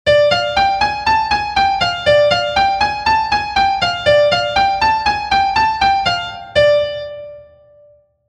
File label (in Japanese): bluenoteスケールフレーズ2